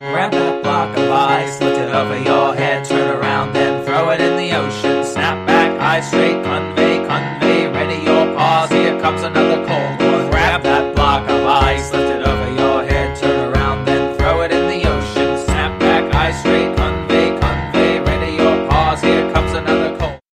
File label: Ice Wolf Song